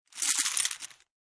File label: win chips; Helloween; plinko